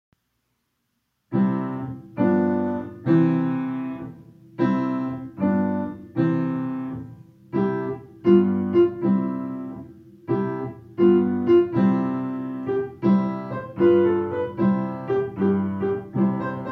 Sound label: Three Blind Mice